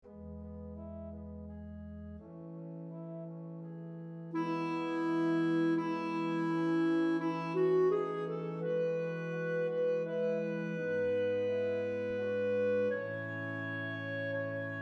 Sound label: Soprano